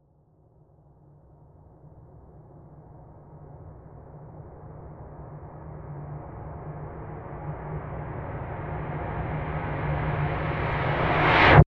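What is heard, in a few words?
sound design build crescendo reversed tin impact; zapsplat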